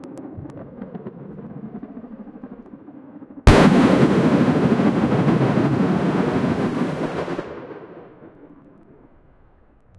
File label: ballistic